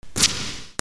RIPPING

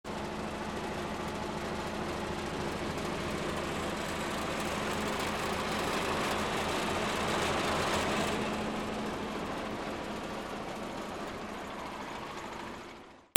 VEHICLES

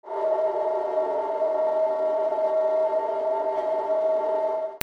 SCIFI

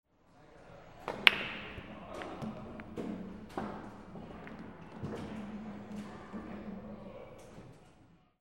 BILLIARD

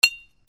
BANGS

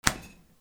DOORS

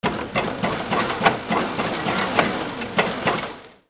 INDUSTRY

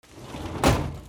OFFICE

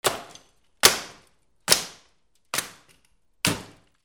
BREAKING

